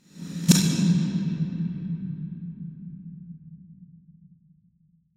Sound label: Reverse Fx; musicradar